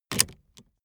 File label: car seatbelt unfasten hard